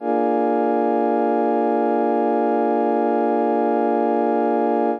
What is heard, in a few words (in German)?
akkord b7 d1 hoch